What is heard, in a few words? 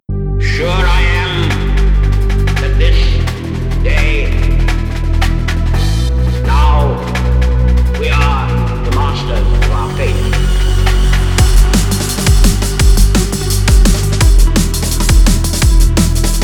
drum and bass